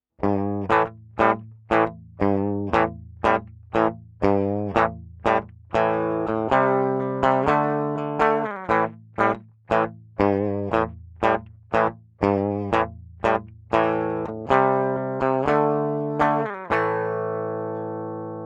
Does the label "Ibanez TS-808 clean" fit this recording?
Yes